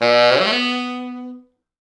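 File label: tenor sax runup, B2; East West; Quantum Leap Horns Sax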